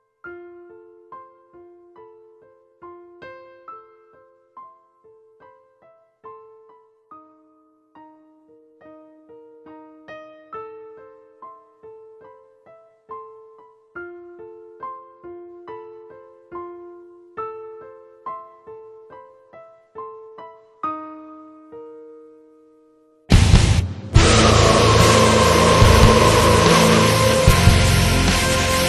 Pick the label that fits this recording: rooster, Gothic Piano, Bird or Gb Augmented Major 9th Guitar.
Gothic Piano